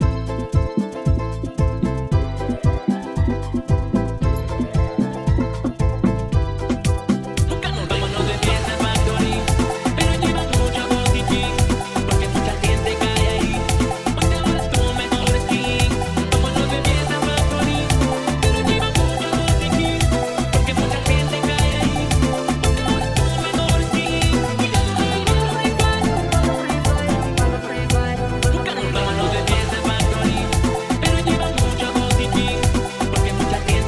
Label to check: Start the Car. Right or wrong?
Wrong